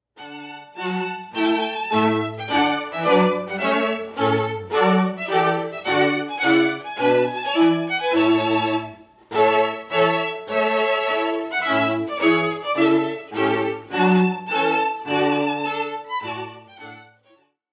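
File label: trumpet tune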